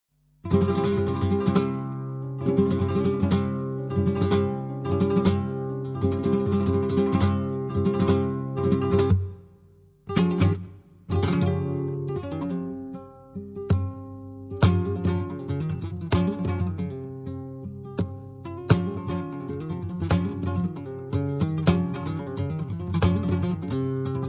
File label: siguir, flamenco